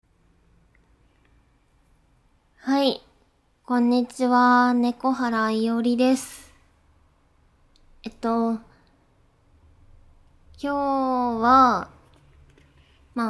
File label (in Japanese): 電動ピストンバイブで超絶ズコズコオナニー